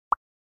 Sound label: popup